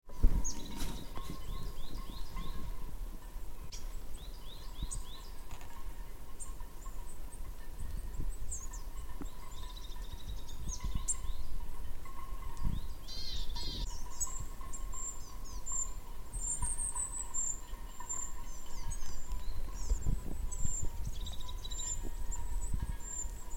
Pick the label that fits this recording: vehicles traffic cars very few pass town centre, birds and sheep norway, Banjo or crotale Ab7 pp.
birds and sheep norway